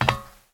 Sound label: Step Metal; Still Life; windows